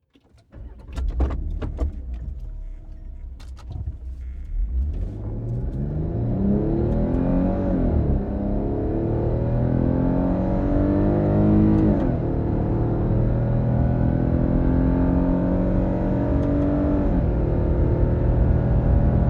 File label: car accelerate internal porsche cayenne